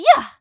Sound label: punch yah; pauline; SM64 CO-OP